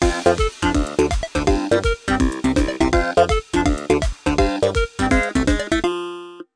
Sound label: Win; Pocoyo Racing; wii